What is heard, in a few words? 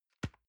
envelope drop soft dry